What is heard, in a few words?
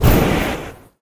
jetpack; cstrike; pubg